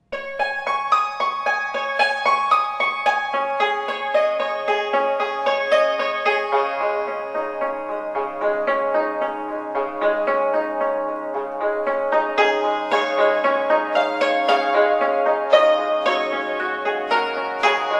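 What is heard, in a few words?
hymns and medleys on chinese hammered dulcimer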